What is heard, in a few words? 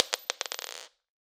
Drop On Floor, Medium Wine Glass